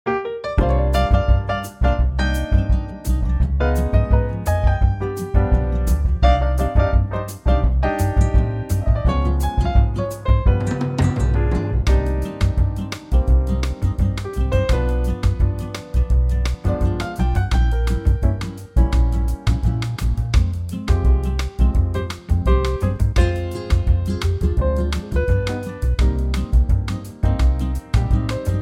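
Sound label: BOSSA NOVA Cm, backing track; key - Cm - vocal range - Ab to Bb; Wonderful bossa nova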